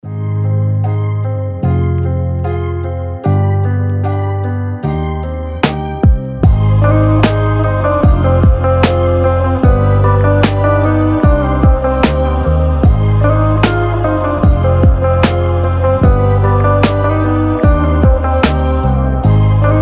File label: rington chill paradise